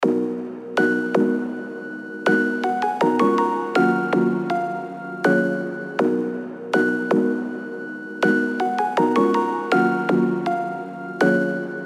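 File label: F minor 161 BPM Plucks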